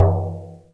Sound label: Percussion; Sampleheads - NYC Percussionworks